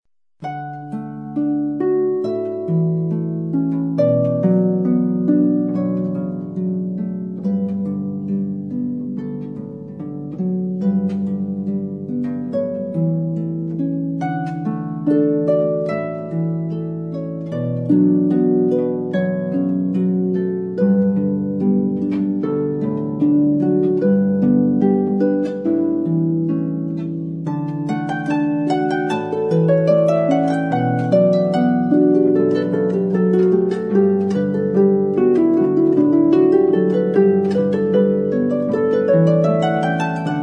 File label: Solo, harp; classical; Pachelbel: Canon